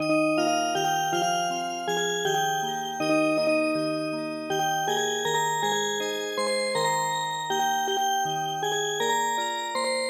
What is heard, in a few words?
Music Box; English Petal